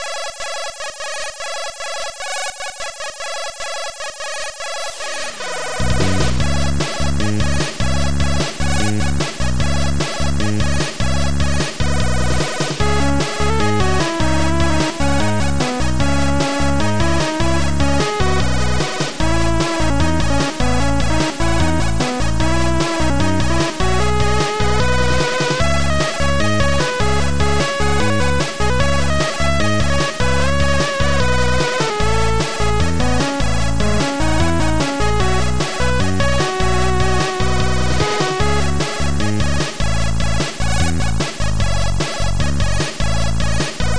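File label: keygen song.mod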